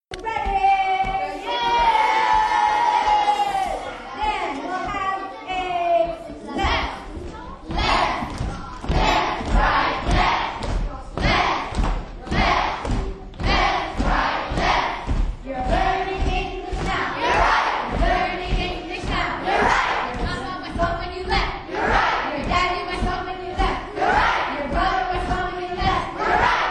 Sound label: Marching Chant